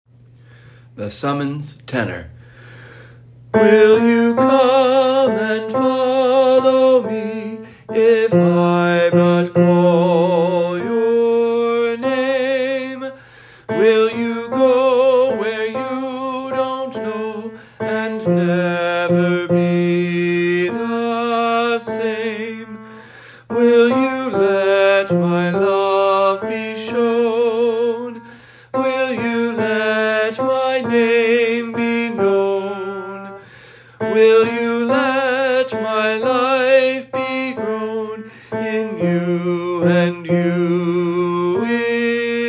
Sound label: Tenor; St. Anthony of Padua - Choir Sunday